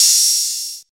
Open High-Hat F Key, Royality free open hi hat sound tuned to the F note. Loudest frequency: 6539Hz